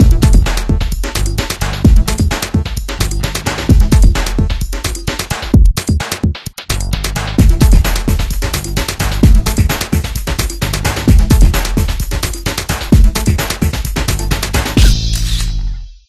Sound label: Cheerleaders Dance Theme; kurt warners arena football unleashed ps1 gamerip 2000